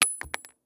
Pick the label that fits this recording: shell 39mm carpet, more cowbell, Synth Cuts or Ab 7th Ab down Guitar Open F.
shell 39mm carpet